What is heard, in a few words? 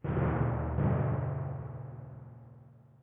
Tymp